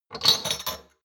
shot glasses grab multiple medium; berufe; gastronomie; soundpool

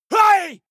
male combat attack short

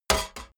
metal hit clank light

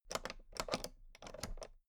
toolbox latch open regular

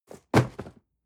cardboard box medium fall high; objekte

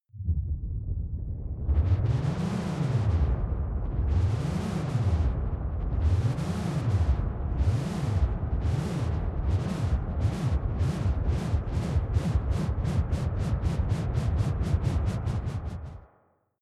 wind

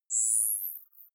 zoom out light high; kommunikation; signale

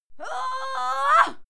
female fight scream yah struggle; schreie; menschen

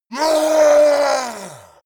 yeti attack shout aggressive command; schreie

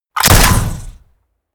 shot

